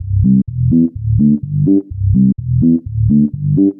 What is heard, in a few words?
126 C BASS, Funky; Loopmasters; Funky Underground Vol 2; BASS LOOPS